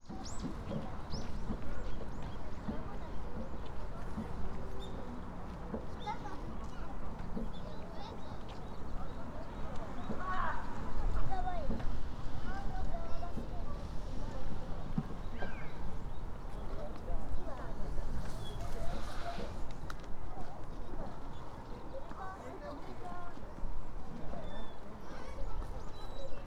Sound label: mt shinobu park